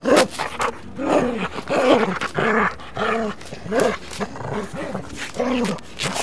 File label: dog; Mafie 1